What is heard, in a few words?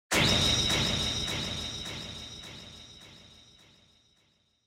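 Onoma Syakiin Heavy Delay, シャキーン; オノマトペ シャキーン; 重 ディレイ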